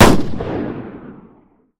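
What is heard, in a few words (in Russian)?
танковый мощный выстрел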